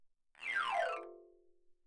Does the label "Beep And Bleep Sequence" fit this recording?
No